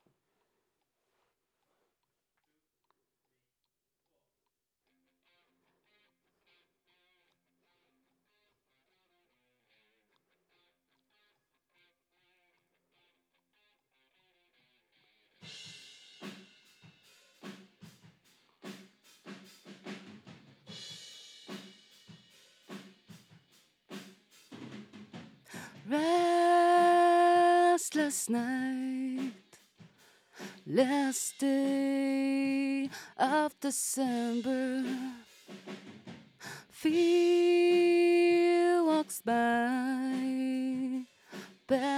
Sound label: Vocal